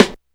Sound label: Snares